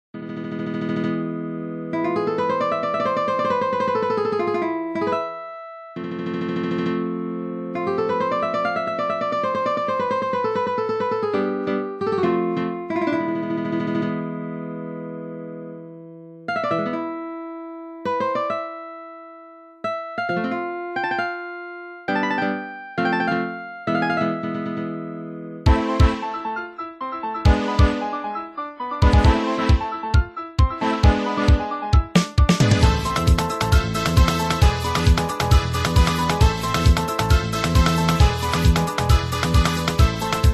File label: Phrygian Dominant Flamenco